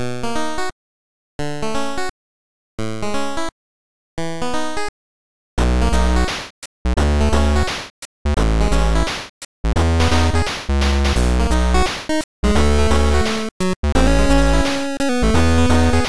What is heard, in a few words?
8bit Midnight Guitar